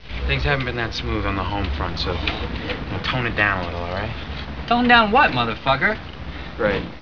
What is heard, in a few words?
tone down